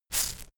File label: insect shaker hit double soft